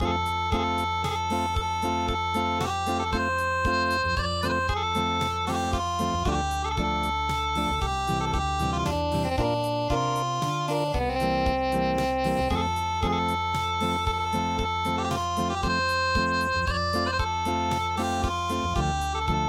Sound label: Acoustic; Torachi